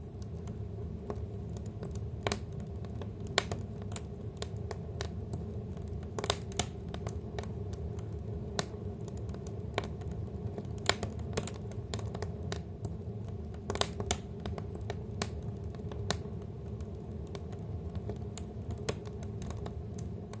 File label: Fire; Kayne Ruse; Keep-It-Alive